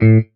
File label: marimba; ゆめ2っき